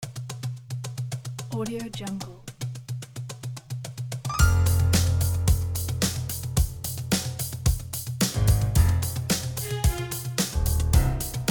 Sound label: uplifting funk with piano and violins